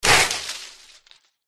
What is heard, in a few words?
flower wound